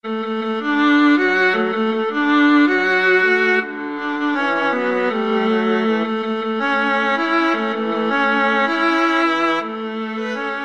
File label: la cucaracha, viola duet